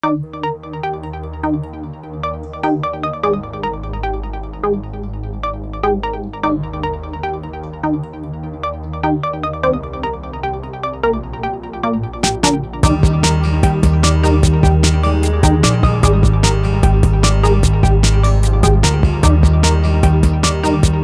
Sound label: Bells Chime